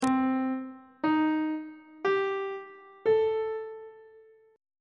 d double flat minor 6th chord intervals on treble clef